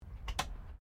CAR HOLDEN FJ INDICATOR OFF, Classic Australian cars; Holden FJ 1955; Cars (Sound Effects:Vehicles)